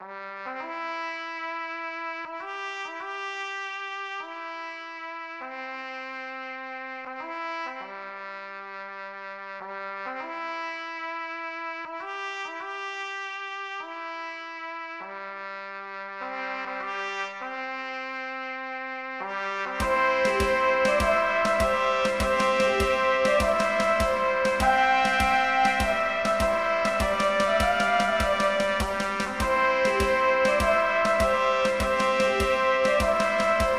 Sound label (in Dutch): Trompetmars